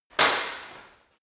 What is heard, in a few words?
sword hit sword single